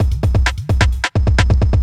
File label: electro kit 130